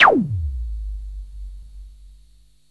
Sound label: ZAP, ZAPS THUMPS; Best Service Alpha Dance vol.2